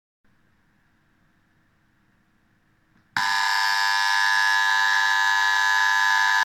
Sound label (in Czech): Tesla bytovy zvonek bzucak